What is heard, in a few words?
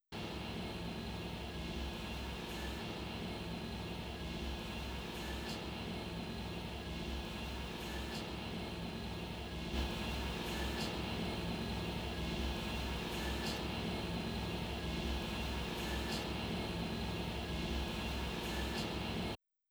Rev Alts Room Tone Solo